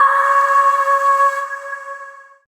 Void Shawzin Oct Three C Sharp; Warframe; Zariman

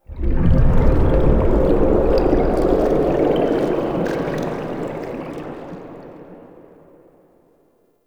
Bubbles Outro Stinger; Warframe; Tau; Cinematics; DarkRefractory

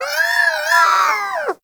Sea Bird Tranquillized; Hunting Critters; Ostron Sea Bird

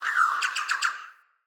flock projectile ready; Enemy; Abilities; Thawtide Hunter; Sinecure; Ranged Hunter Thawtide

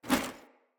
Foley Action Armour Melee Swipe; Deora Armour

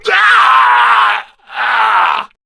Death, Male; Warframe; Grineer Male B; Battle Barks

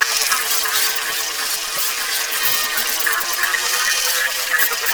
glyph puzzle recorder loop; Warframe; Duviri

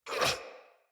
Pain Knight Male; Mendicant

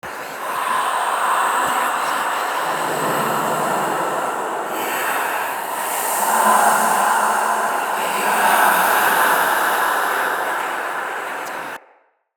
Coffin Rise Whisper Loop; Midrath; Crypt; Circade

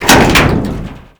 Door Hatch Open End

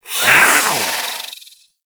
Catbrow Feral Vocal Attack Medium, Enemies; Warframe

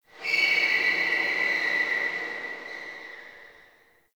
Distant Car Squeal; Warframe; Nineteen Ninety Nine

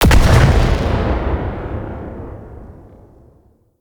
Dropship Explosion; Venus

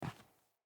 Boot Walk Default, Footsteps; Characters; Enemy; Boots; Sinecure; Melee Grunt

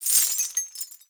Movement Dash Chains; Warframe; Uriel

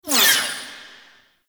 Laser Fire Whiz By; Enemies; Weapons; Warframe; Tau; Sentient Dactolyst

